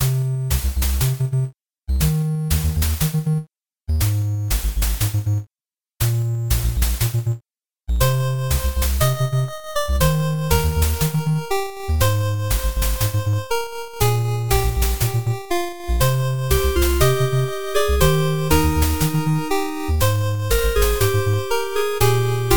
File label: menu theme